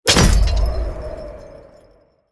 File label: Grenade Explosion; Krampus Trap